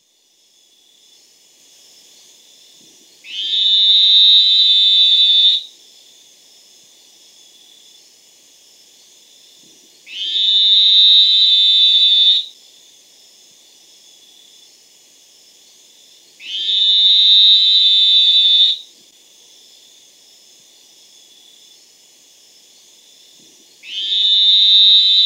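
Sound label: Elachistocleis ovalis, frog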